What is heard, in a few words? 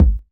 tight lows kickdrum sound g key; kick drum samples